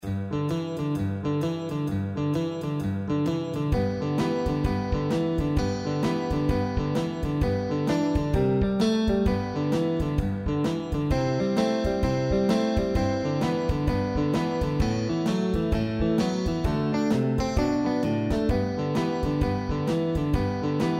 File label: boogie brass, 2 players and rhythm section